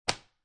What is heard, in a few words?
Book Hardcover Drop On Desk, Foley, Book, Hardcover, Dropped On Wooden Desk